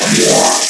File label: emp spin; zaero; items; empnuke